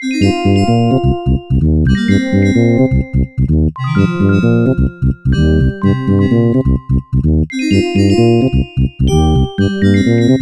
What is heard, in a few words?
Sound Blaster Pro 2; SimCity 2000; MS-DOS Version; Happy Land